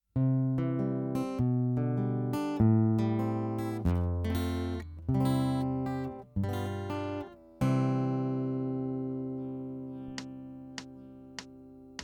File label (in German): sommerspaziergang playback